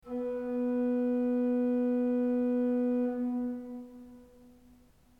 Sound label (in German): ton, orgel